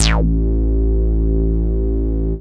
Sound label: moog, bass; Ueberschall; Techno Trance Essentials